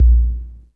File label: Kick One Shot, Drums